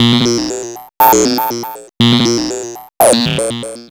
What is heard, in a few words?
MOOG HAT; AMG - Vince Clarke Lucky Bastard